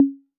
808 CM, Roland MV-8008; Roland MV8800

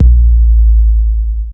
KICK, Hip Hop

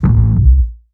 Dum Dum, Drum&Bass Hits & Bits; Total Drum&Bass; 90 s Sample CDs

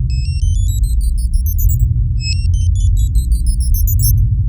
Ambient FX, Ambience FX

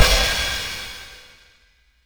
HIHAT OPEN; DRUM N BASS:JUNGLE BEATS; DRUM N BASS KIT 2

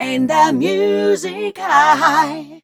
f mel e flat, fem Vocals

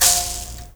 MOBO LO, MOUTHBOW, Heart of Africa

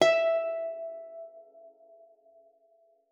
E5, GUITAR; Xsample VOL-2

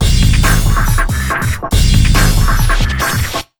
TECH 140, Big Beat Drumloops

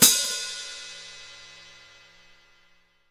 K-ZIL HHO, 14INCH K-ZIL HI HAT

